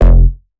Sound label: Bass F, Bass Synth; 99Sounds; Monosounds; Music Loops; Instrument Oneshots